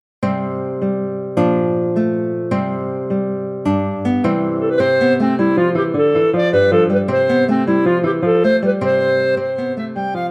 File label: clarinet; hunting the hare